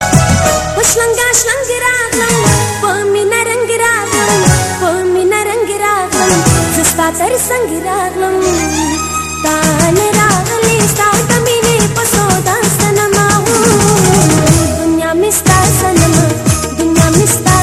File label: Beautiful arabic Song